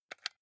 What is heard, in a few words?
console controller xbox onoff press fast